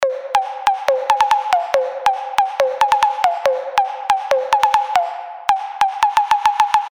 140 C Beasts

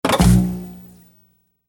TV Turn On

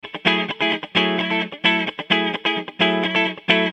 Funk Guitars

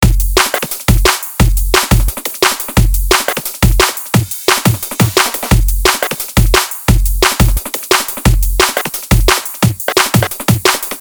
175 Drum Loop

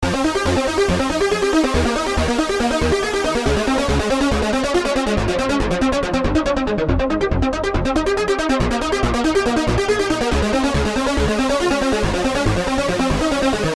140 F Synth line

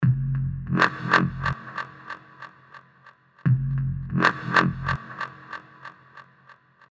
140 F Synth Bass

